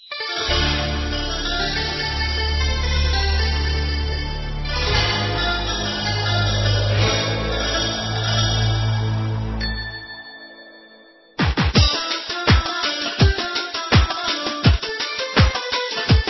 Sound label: tanec flamenco